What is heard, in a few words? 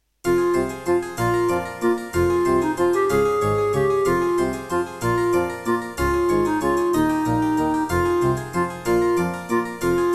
Serdaco MP32L_CM32LROM; Death Gate